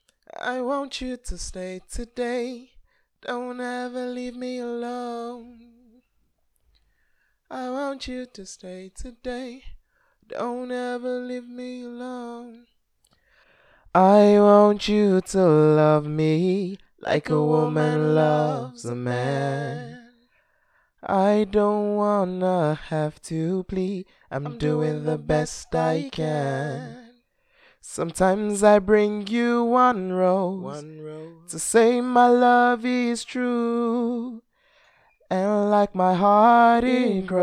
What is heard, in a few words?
acapella, pop RNB 140 BM